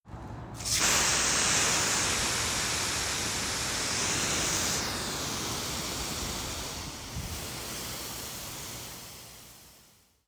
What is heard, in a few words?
Water Hose